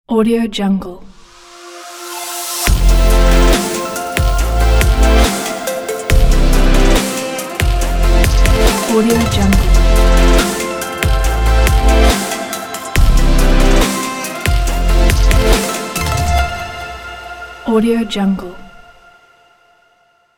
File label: modern pop logo